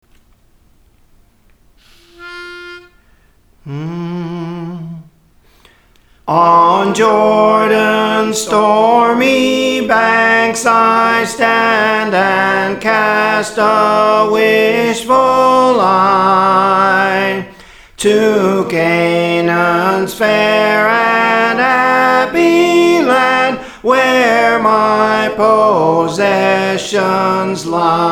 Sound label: Soprano, Songs; On Jordans Stormy Banks (McIntosh); Praise For The Lord Training